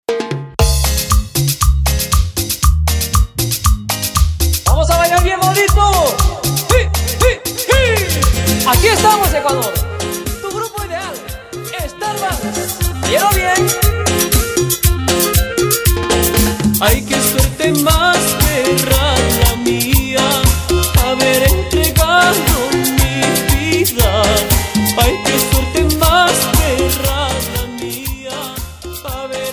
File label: Intro Simple Percucion 118 Bpm; Percucion Orginal